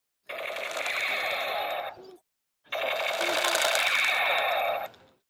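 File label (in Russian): detsk avtomat, toy gun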